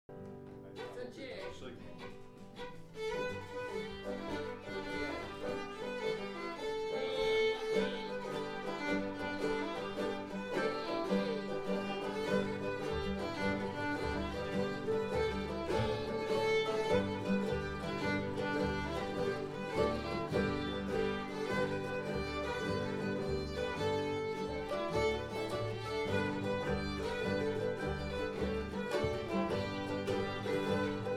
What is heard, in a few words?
Jig